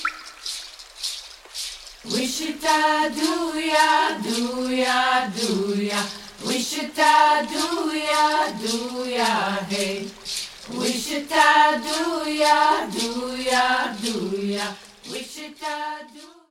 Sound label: Water Blessing Chant; Cherokee